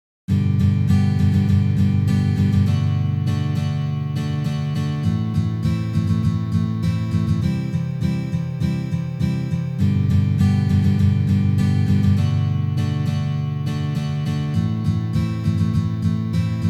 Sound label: strumming combi strumming 3 patterns; Strumming is het aanslaan van de snaren volgens een bepaald slagritme.